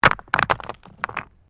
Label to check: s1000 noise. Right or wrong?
Wrong